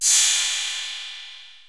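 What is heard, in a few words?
808 cymbal; Wizoo; Platinum 24 Electronic; 480L BW 808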